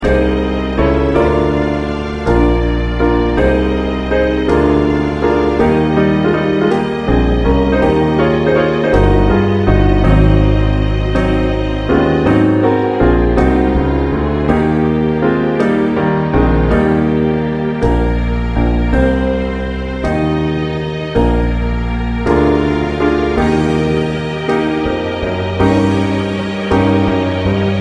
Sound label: Accompaniment